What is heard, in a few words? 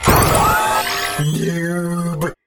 Taunt - Mech; Robocraft